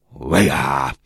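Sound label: caveman voice sounds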